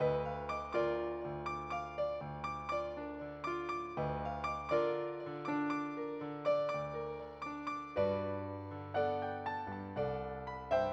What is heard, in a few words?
E Piano